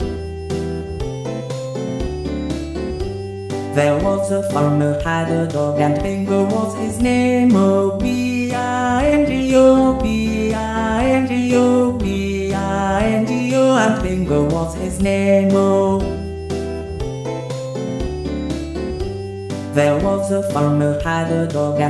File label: bingo song, vocal